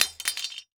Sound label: Industry Machete Dropping On Cement